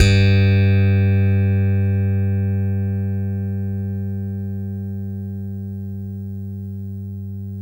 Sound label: 6 STR FUNK; Spectrasonics; Bass Legends